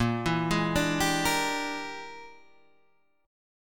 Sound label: E 7th Sharp 9th Flat 5th A sharp, chord